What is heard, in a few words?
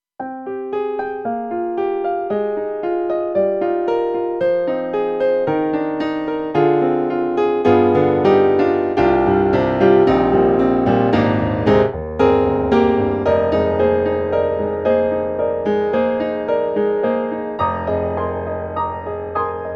Piano Dry; KORG M3 XPanded Program